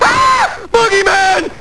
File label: pain; quake2; homer1 4